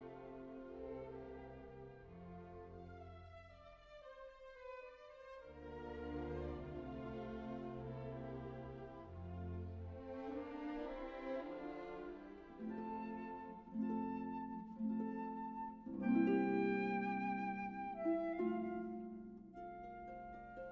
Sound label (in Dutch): Concert (fluit en harp); Mozart